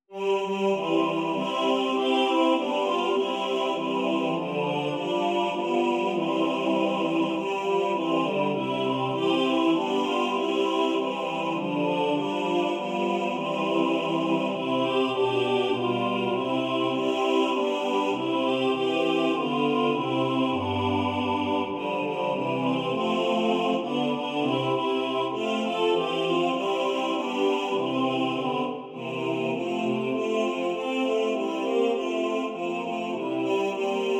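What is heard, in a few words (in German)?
alle Stimmen gleich gewichtet, Männerchor; Gegrüßt seist du Maria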